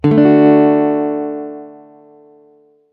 d major open, chord

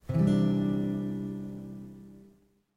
c add9 open, chord

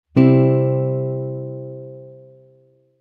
major 5th

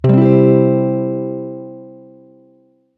c major e shape, chord box, guitar

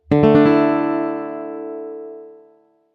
d sus 2, chord box, guitar

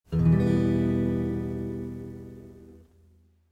e min 3, chord box, guitar

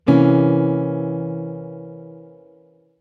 c dom7b9, chord

